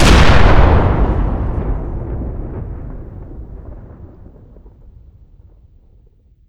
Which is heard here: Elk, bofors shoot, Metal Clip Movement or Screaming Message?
bofors shoot